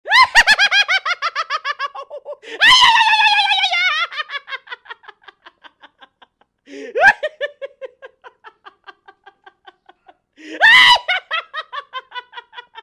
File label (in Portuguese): risada bizarra